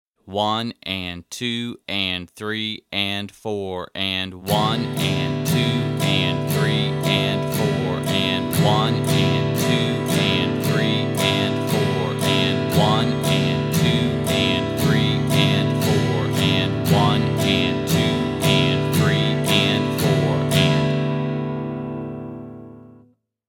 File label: Strumming